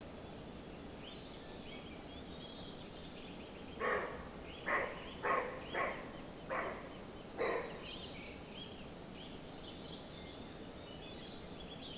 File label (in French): Chevreuil; Issaux